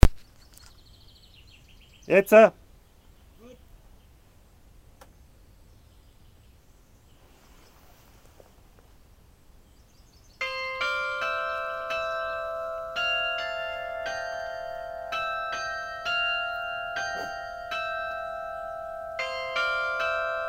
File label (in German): glockenspiel lang; Fuerther Rauthaus